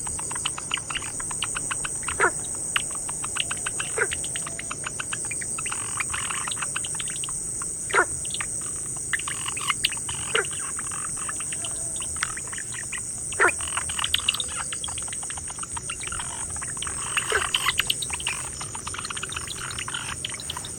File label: Hylarana taipehensis